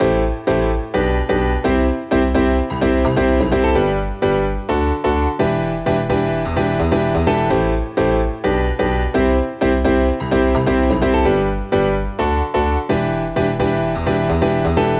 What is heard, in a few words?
House Piano Loop - 128 BPM E Min; Instruments; Loops